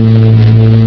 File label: Laser C Fire